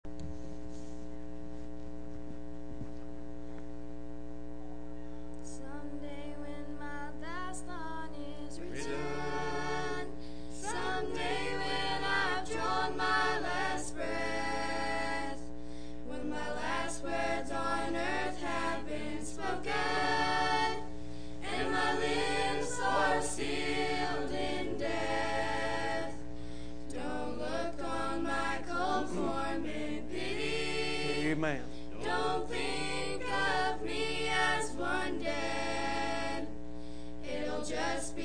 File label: singing Family